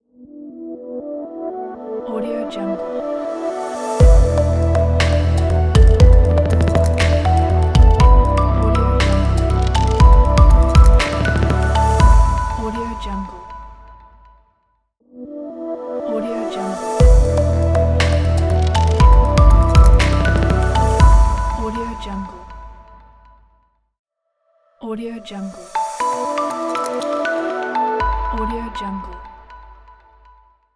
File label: digital minimal modern logo